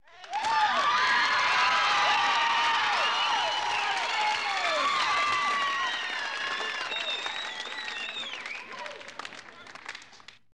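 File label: Crowd 100 Interior Canned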